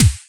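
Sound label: kick, Boss DR-202; Drums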